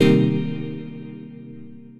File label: Old Keys, Synth Keys; Ableton; Glitch and Wash